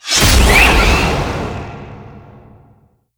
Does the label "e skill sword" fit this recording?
Yes